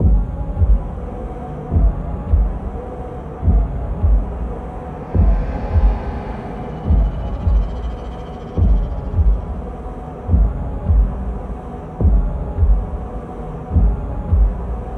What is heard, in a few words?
Amb